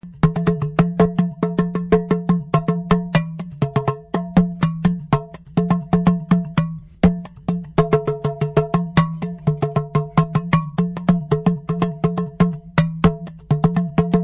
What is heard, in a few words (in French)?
arrosoir; Divers tambours et sculptures sonores en argile